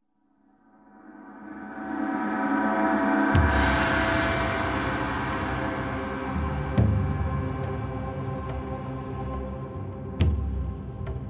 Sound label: Chakra Meditation Music, New Age; Root